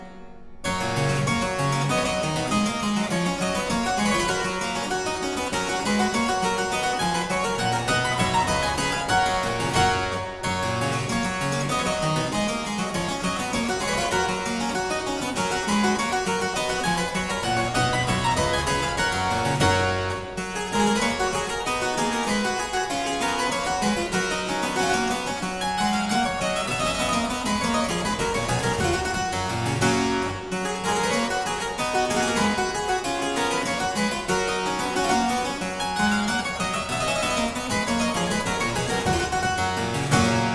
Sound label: Aria Variations in G Major, Harpsichord; La Capricciosa; BuxWV 250; Partita 21; Buxtehude; Opera Omnia; Harpsichord Works